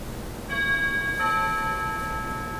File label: doorbell; ding-dong